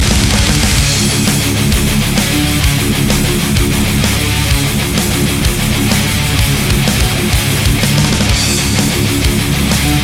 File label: metal slaughter